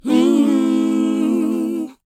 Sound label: WHOA, R&B & Dance vocal; ILIO; Vocal Planet